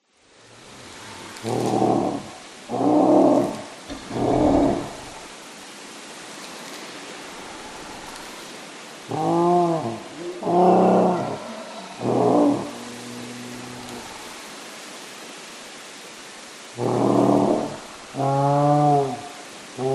animals dinosaurs forest feeding ambience wind trees